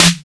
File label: SD, Hard & Loud Techno; USB Soundscan vol.01; AKAI